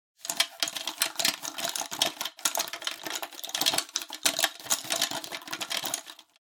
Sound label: Pencil Case Rummage